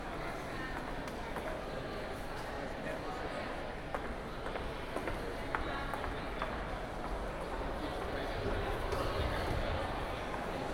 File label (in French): ambiance